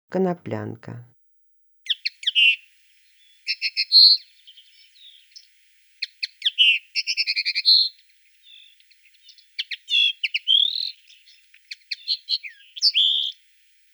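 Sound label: common linnet voice; konoplyanka obyknovennaya golos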